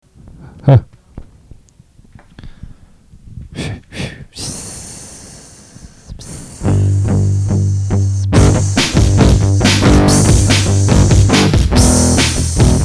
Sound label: serpent